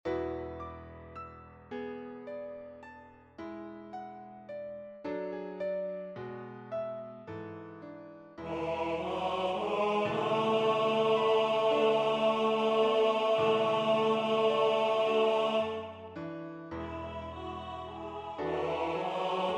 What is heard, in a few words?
Tenor